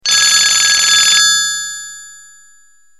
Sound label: Old Phone, ringtones